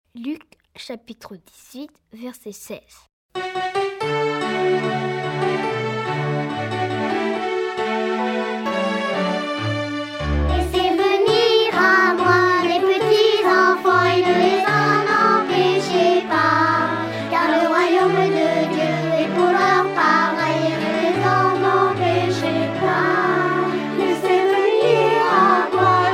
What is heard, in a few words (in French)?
chant